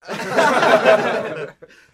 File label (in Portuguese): risada de reacoes